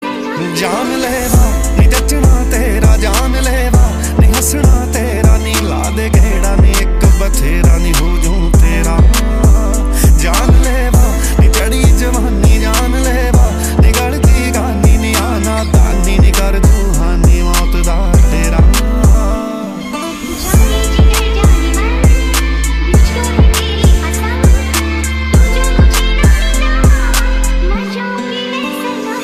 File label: Ringtone